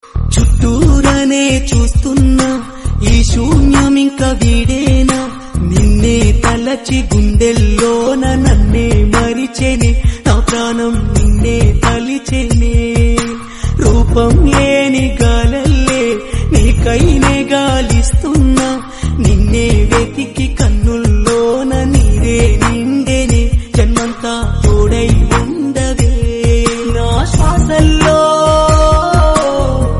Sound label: Telugu Love Failure Ringtone